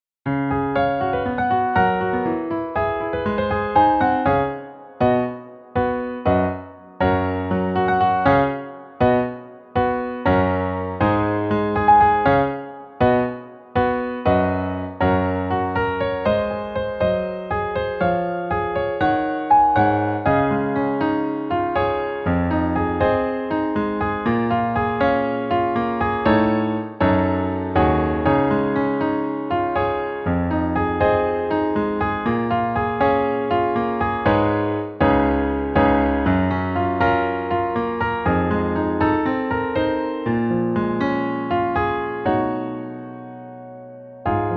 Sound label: Piano Only; audition; mtwichita and mtyp youth auditions